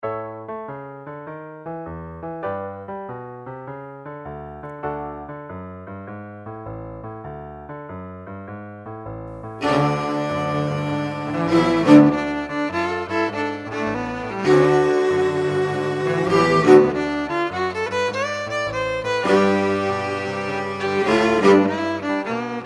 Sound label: Blues Solo Example; Honors Orch 2008